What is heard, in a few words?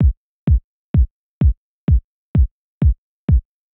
drum; whisper